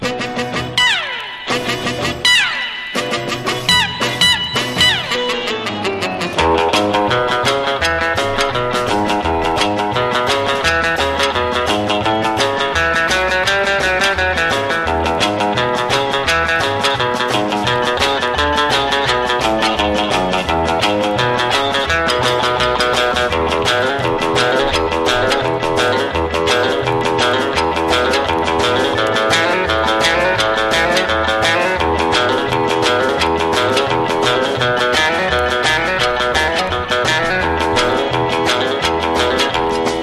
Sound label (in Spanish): BOOGIE EN GUITARRA, easy listening